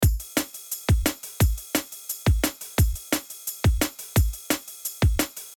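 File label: Kick Snare and Percussion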